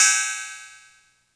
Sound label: Cymbals, Elektron MachineDrum SPS1 MKII